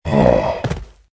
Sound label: zombie death; CityHero